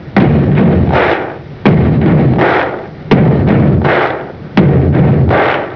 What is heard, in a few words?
BOOM BOOM, SPORTS